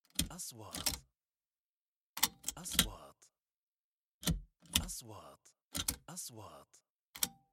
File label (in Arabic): نغمة كليك; transitions